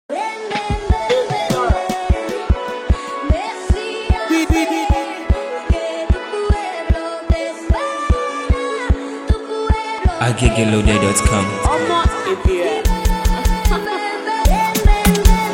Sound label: Beat